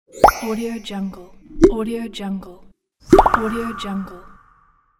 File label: infographic transitions; n-audio